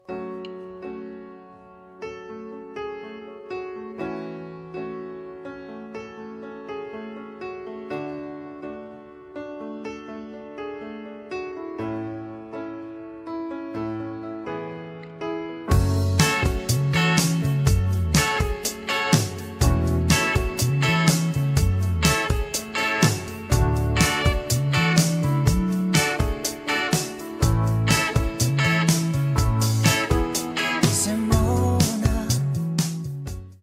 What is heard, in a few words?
Guitar Cover